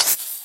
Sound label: creeper; mob; minecraft pe 0.6.1